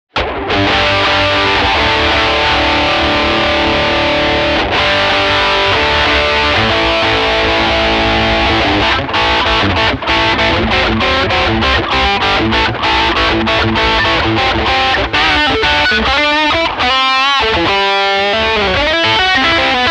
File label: Tubescreamer on; Screaming Blonde; DRIVE 4 h